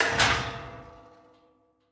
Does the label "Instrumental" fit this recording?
No